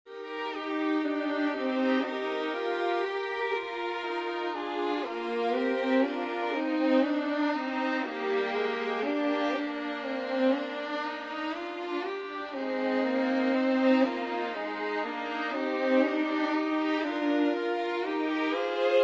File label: My Heavenly Father Loves Me Violin E-Flat Major